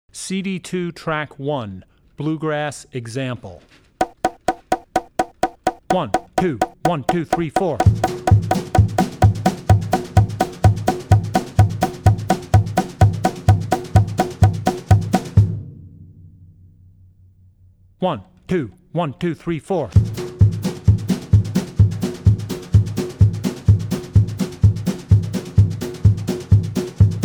Country - Bluegrass; CD2 Track1 Bluegrass; Drummer's Bible Tracks

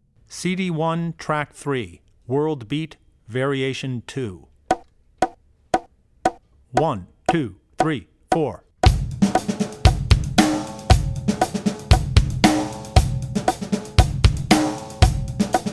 World Beat (Variation 2); Drummer's Bible Tracks

